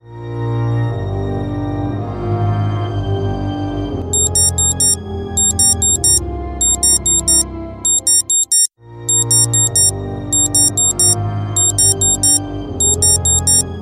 Morning Buzzer Alarm

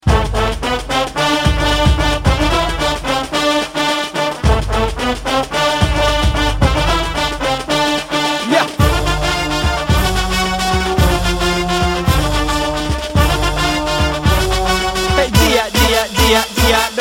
emotional tone

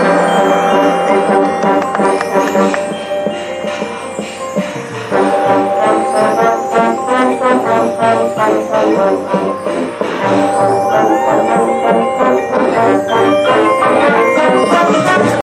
Baritone

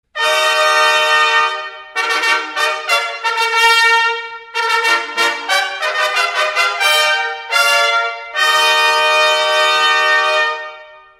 trumpets; london

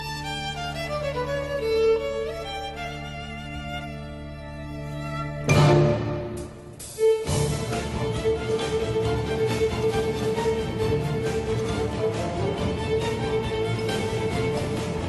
toccata, ringtones classical

